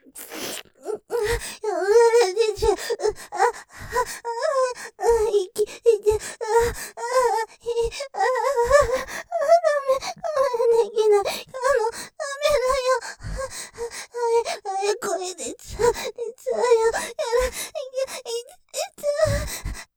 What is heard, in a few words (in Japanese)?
喘ぎ ふやふや声で 感じちゃう・声我慢できない, ASMR; ステレオ; ループで楽しむ！シェフの気まぐれ抜き抜きコース